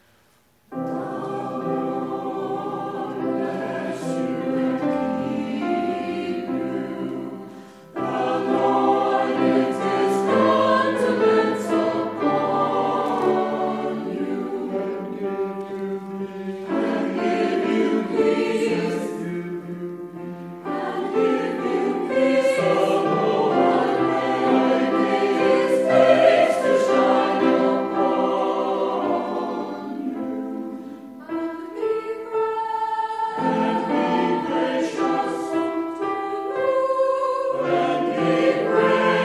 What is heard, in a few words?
choir